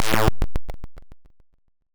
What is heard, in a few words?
teleport; baconthulhu